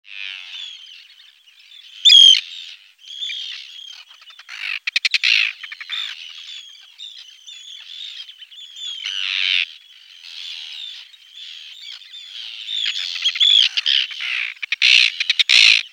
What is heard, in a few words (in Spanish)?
charrán